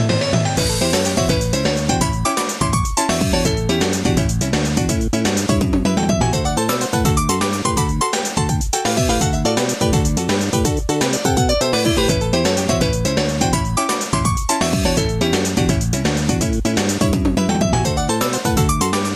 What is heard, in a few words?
Jazz Alarm